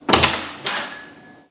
Door move; Five Nights at Freddy's Final Hours 2

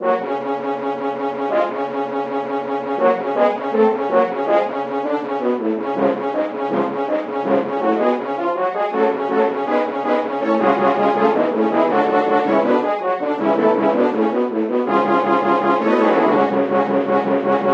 Minstrel Shield Horn; gamerip; the bards tale remastered trilogy

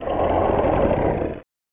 Roar; Super Morph 1993 SNES

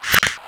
spooky bone

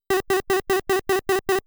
Walk; The Tower of Druaga

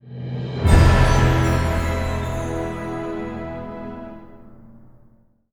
Quest Complete Jingle

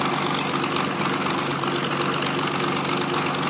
Propeller hat Desert Loop; doodle jump 2; android

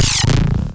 BLiNKiNG

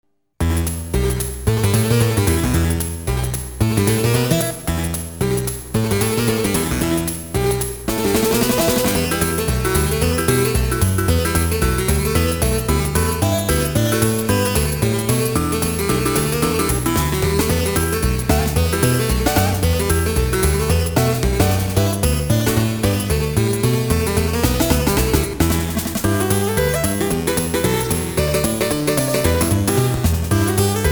Swing, Swing ~ Jam Blues; Konami MSX Super Best Antiques

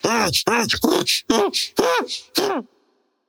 inmate death; Devour

